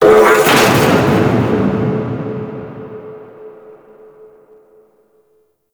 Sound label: Grn Fortress Distant Gates; Warframe; Grineer; Ambience